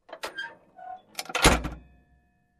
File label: Closing squeaky metal compartment